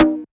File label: Conga Hi Drive; One Shots; Drums; Ableton Live 12 Lite; Core Library